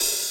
Hi-Hat, 095 Cmin

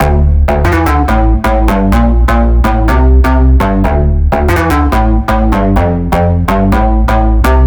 Big EDM Bass Loop D4; Future House; Future House Tools; Bass Loops

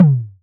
EDM Future Percussion; Big EDM; Future House Tools

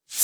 Reverse Hat Hit, Bmin; Hip Hop; Hip Hop Exotica; Apple Loops; Big Fish Audio

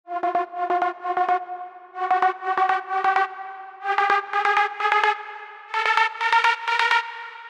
Fx, Big EDM Drum Loops 128 BPM; Future House; Future House Tools

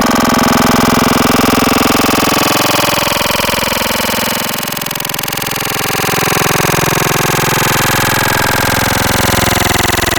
Invader, Growl Tables; Cymatics; Growl Tables Vol 2; Serum

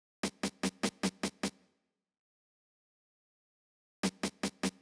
Snare, 075 Bmin, Drum Tracks

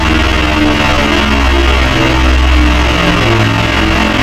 Flang Table, Wavetables; Cymatics; Essentials Wavetables; Serum

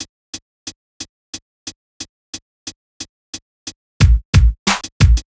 Drums, 090 Cmin; Hip Hop Exotica; Apple Loops; Big Fish Audio

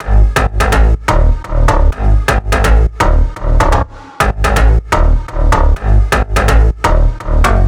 Big EDM Bass Loop G#1; Future House; Future House Tools; Bass Loops

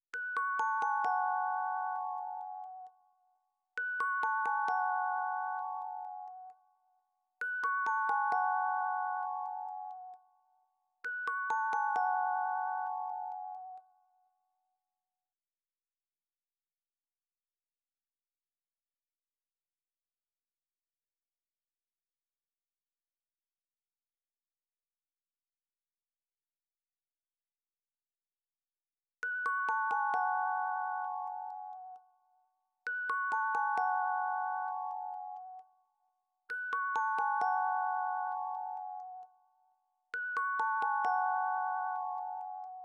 132 BPM F# Min EPiano; Cymatics; Destiny Gold Expansion; Afterlife

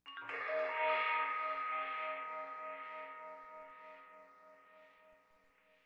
Stab; Thirty-One Flavors

